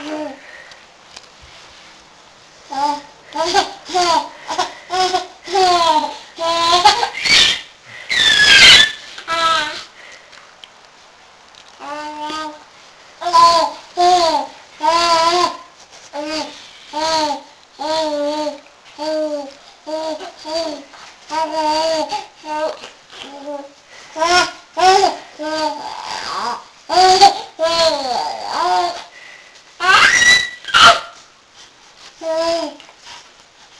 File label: giggles